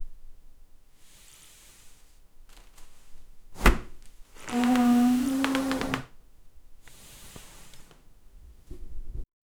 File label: asmr of standing up from, chair